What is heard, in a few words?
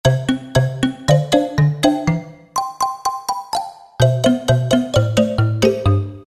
Syn Marimba, Roland D-50; Factory preset demos